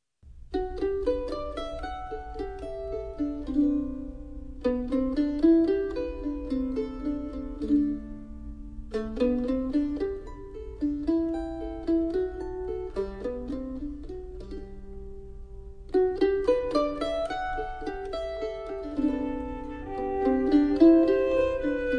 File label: cello; arr. Meyer